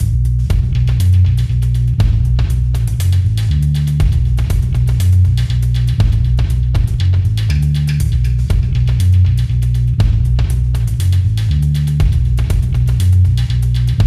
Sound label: Music Lower cabinet; wii gamerip; mushroom men the spore wars